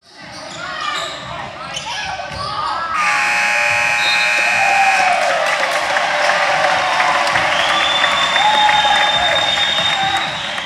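applause NBA live ending with whistle sound effect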